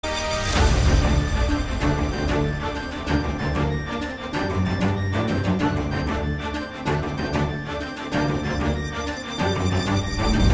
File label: Ringtones BGM, Tamil; Ka Pae Ranasingam